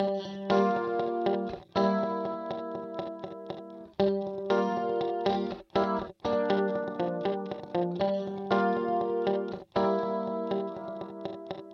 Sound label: Guitar Eventide H8000 W I D E Solo; Vintage Digital